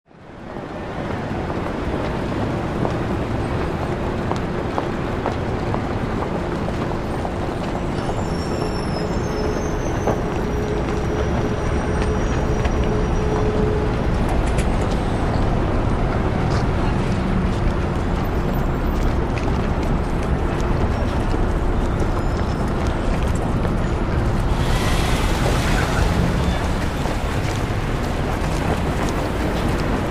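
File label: City Traffic Footsteps; big city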